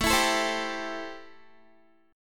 A Minor 7th, Guitar; Am7; strummed; chordshape; 17,19,19,17,19,x

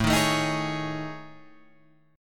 A Major 7th Flat 5th, down

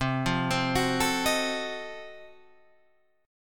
F 7th Flat 9th C

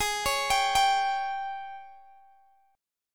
Ab Major 7th Suspended 4th, Guitar, Open G; down

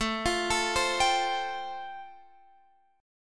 A-Minor 7th Sharp 5th, Guitar Standard, chord; x,12,15,14,13,15; Am7#5 arpeggiated; JGuitar

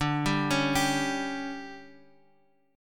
D-Major 7th Suspended 4th, D, Guitar, Open F; arpeggiated; 14,14,13,14,x,x; chord

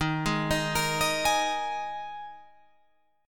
Eb Suspended 4th, Guitar, Open F; Ebsus4; arpeggiated

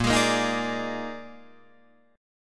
Bb 11th, Guitar, Drop D

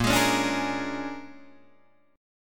Bb 7th Sharp 9th Bb Guitar Open D, chord

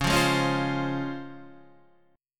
C sharp Major 7th C sharp, Guitar Standard; C#M7 strummed; 9,8,6,6,6,8; JGuitar

